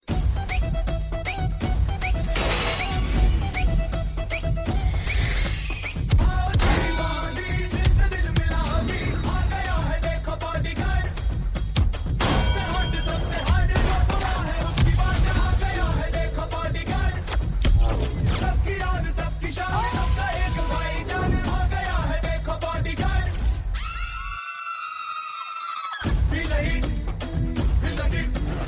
hindi musical song tone ringtone

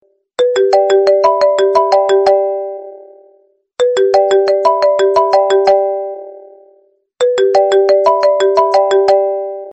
iphone sms ringtone

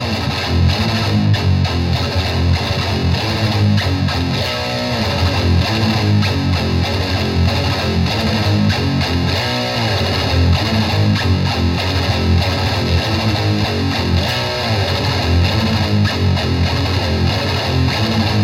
metal ringtone

